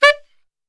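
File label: D saxophone shot, Brass Super Section; Best Service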